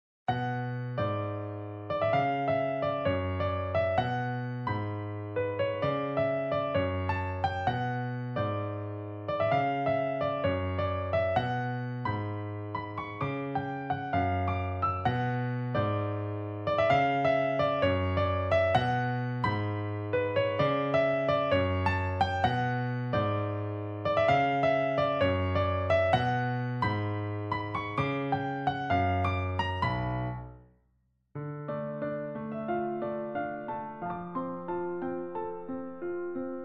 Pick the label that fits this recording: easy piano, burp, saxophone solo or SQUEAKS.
easy piano